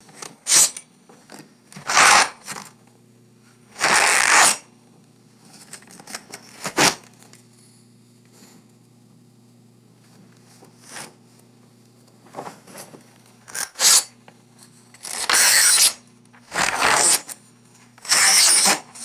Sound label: paper sliced and torn with razor blade